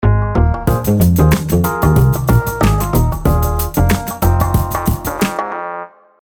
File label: closing sting